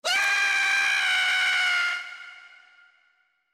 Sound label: Last Scream; Scrat